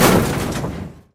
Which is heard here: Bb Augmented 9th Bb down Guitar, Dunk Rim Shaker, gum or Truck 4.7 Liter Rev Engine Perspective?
Dunk Rim Shaker